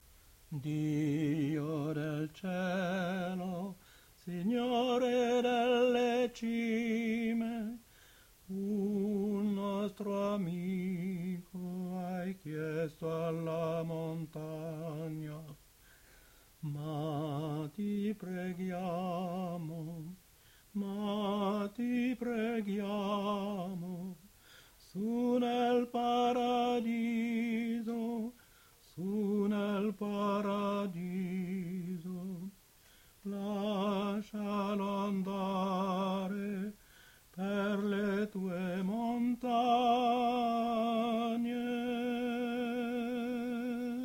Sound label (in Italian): soprano